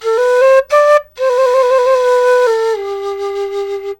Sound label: SERULING, Heart of Asia